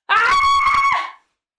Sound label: scream